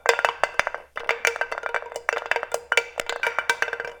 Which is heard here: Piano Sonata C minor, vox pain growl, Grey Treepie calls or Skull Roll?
Skull Roll